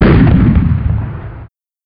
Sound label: cannon fire